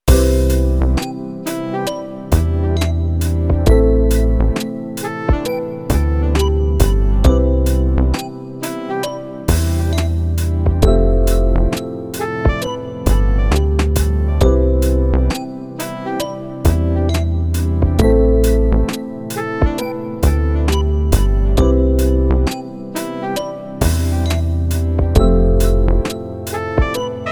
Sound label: lofi melody